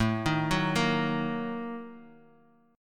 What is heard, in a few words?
Bb-Major 7th Suspended 4th Sharp 5th